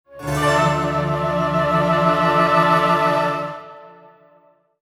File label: Play Stinger; Gilneas